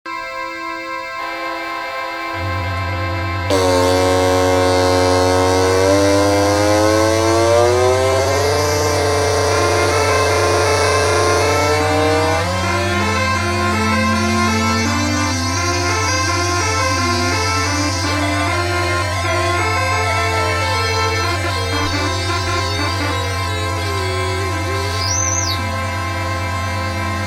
casiotone

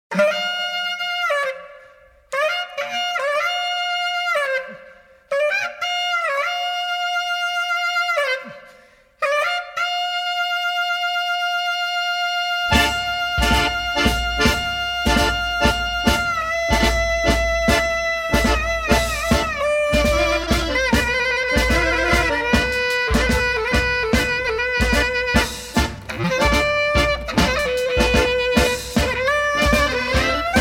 klezmer madness; クレズマ―; Tzadik

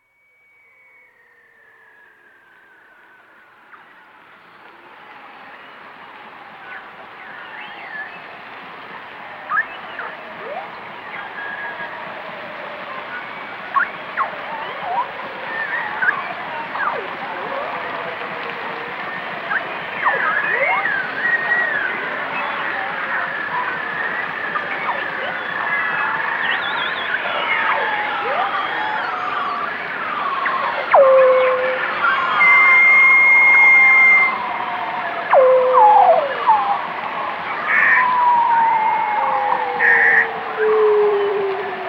whale sounds

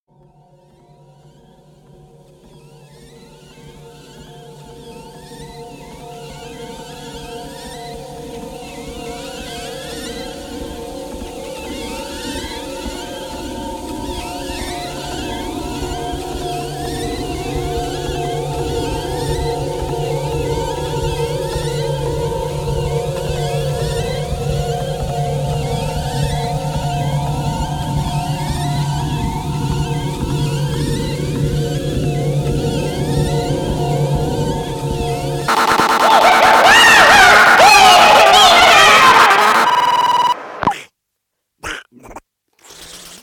voice

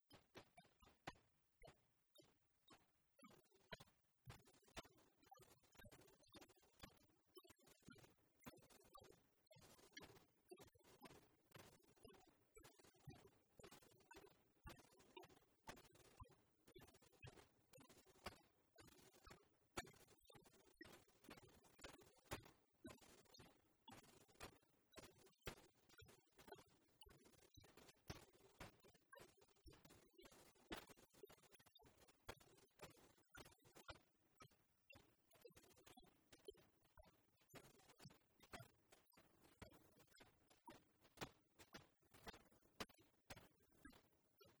gong

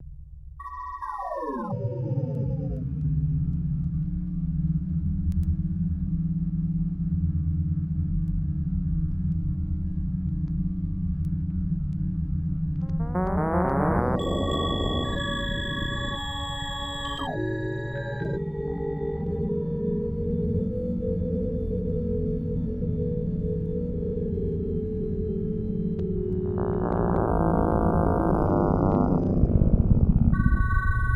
sand machine

